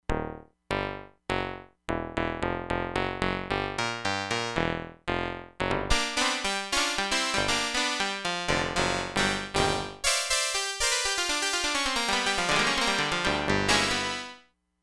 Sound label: CLAVICHORD